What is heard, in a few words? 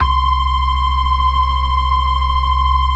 organ; Keith Emerson Signature Series